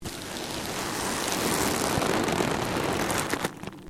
LEATHER MOVEMENT; Material components for constructing more complex sounds; Dressing (Sound Effects:Human)

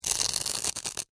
Crunch Rip Tear, Floral, Foam; Texture; Crunches; Objects; BlastWave

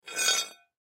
Metal Rod Pick Up, Metal Rod Pick Up Pipe; Metal (Sound Effects:Materials); Foley; Blastwave

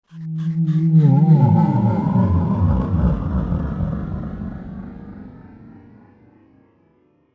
Aliens Of The Deep; Alien Sound Effects; science fiction sound effect; Andrew Fleming; Twistedtracks

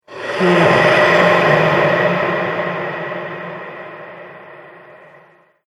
Ghost Breath, scary breathing; Scary Breathing Sound Effects

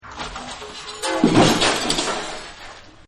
Plate Glass Gone; Materials; Partners In Rhyme

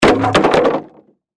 Crash Wood Drop On Cement; Sound Effects:Materials; Blastwave

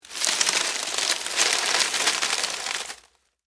Foliage Crunch, Movement, Palm, Frond; Texture; Rustling; BlastWave

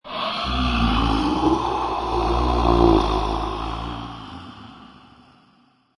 Large Ghostly Moan, Eerie, scary; large monster or creature, threatening ghostly moan, snarl, single long breath with reverb; Lynne Music

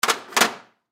Ratchet Set Down; Power Tools; INDUSTRY; Blastwave

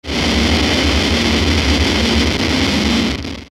Distortion Static Low Wash; Blastwave

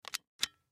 Lamp Turn Off, Lamp Turn Off Pull String; Lamps; Household; Blastwave

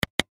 Camcorder Record Button Push, Sony Mini Dv; Camcorders; Blastwave; Technology

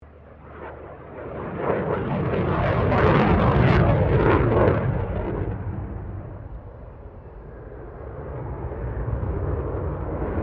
AIRCRAFT F16 FALCON PASS; Airplanes; Vehicles

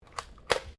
GUN TOY DART PISTOL COCKING; Toy Guns (Sound Effects:Toys)

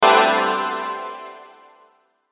Hit Burst Chime Reverb; Hit Burst Chimes Reverb; Production Element Imaging Element Accent Transition

